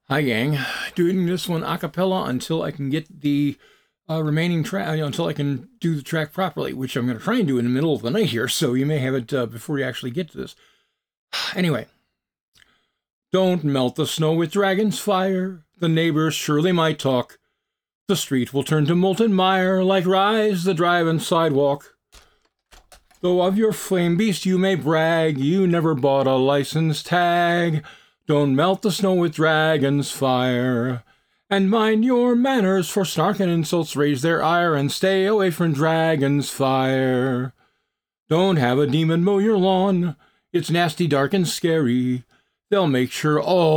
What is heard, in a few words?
A Cappella